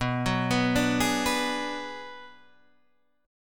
Ab Minor 7th Flat 5th B

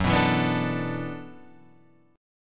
E 7th Suspended 2nd Suspended 4th, Gb, chord

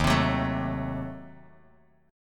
D sharp Diminished 7th D sharp, Guitar, DADGAD; chordshape; 1,3,4,2,x,x

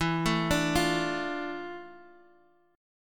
D Minor E, chord; Dm/E arpeggiated; JGuitar

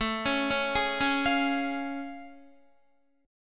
A Augmented Major 7th A Guitar; arpeggiated

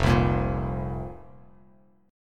F Suspended 4th F, Bass Standard; Fsus4 strummed; JGuitar

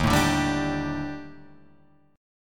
Eb Major 7th Flat 5th Eb; EbM7b5; strummed; JGuitar

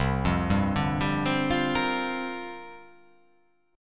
C 9th Suspended 4th C down

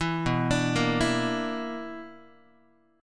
B-Minor Major 7th Double Flat 5th, Guitar; BmM7bb5 arpeggiated; JGuitar

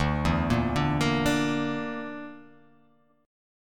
D Suspended 2nd Sharp 5th D

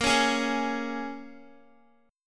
A sharp Minor 7th, A sharp, down, Guitar; A#m7; strummed

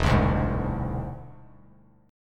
E Augmented 7th E down; E+7 strummed; JGuitar

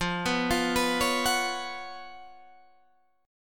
Db 11th F, guitar

